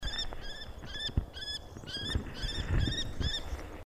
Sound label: Kestrel